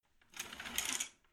Household Drawing Curtains Wooden Rails Sliding Open Slowly; Windows